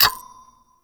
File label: Sticky Bomb Detonate; Grineer; Veilbreaker